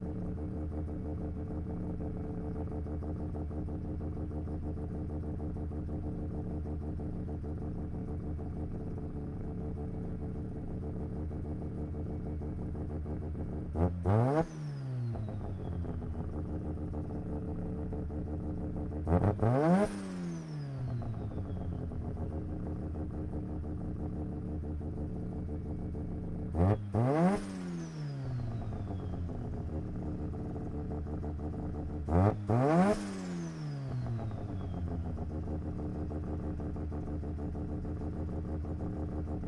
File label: Mazda RX7 ext idle blips steadies in neutral off wide; Sonniss GDC